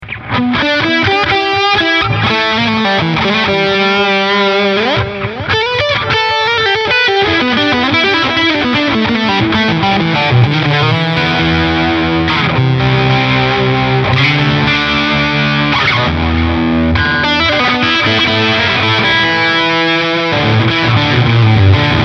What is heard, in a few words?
Nux Trident SL Lead